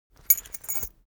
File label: cloth key ring small trousers pocket put; foley; smartsoundfx